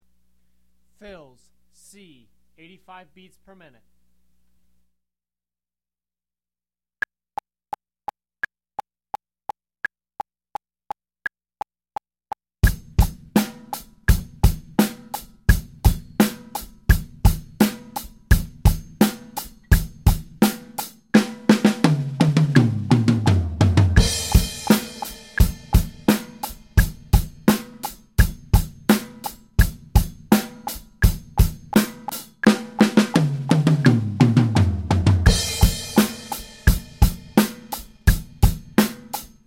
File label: Beginner Fills 85BPM; Drum Lessons